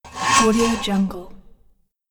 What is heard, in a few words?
saw slice